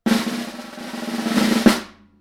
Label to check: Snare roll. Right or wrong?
Right